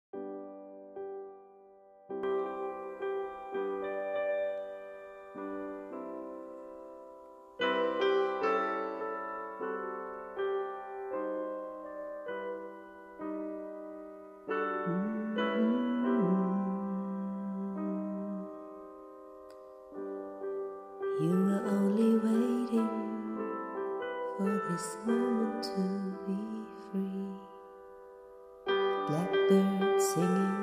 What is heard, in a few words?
piano